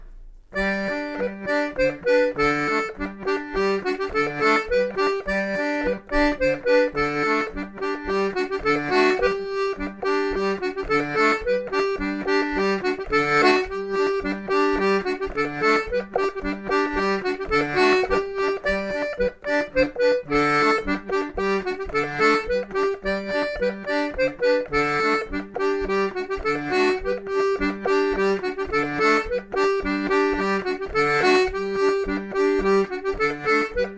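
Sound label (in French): maraichine, accordéon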